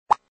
turntable

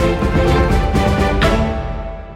Pass Success

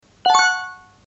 Red Packet Award

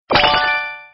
open interface

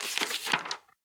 window

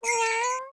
achievement Window

